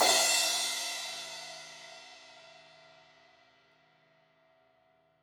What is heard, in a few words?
Crash 16in edge, Cymbals; Deeply Sampled Drums